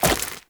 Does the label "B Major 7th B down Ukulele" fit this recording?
No